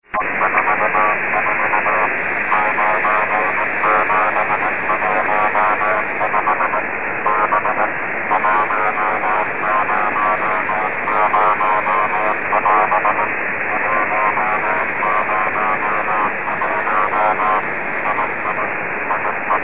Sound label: Amateur Radio QSO with noisy Morse (spark gap maybe); HF